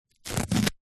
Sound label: household plaster band aid peel from skin